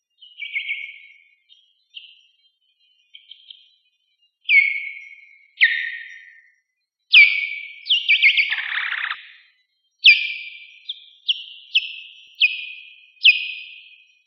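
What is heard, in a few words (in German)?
Ruf des Großen Abendseglers stark fragmentiert; NABU Seeheim-Jugenheim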